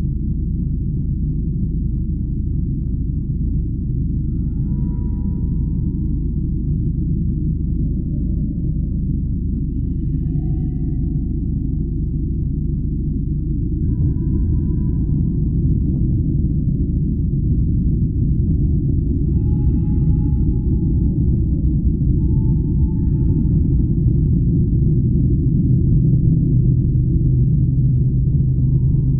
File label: Final Assault Int Ambience; Starfire